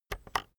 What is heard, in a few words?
keyboard external enter press slow